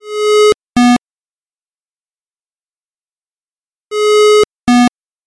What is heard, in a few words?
Simple Business SMS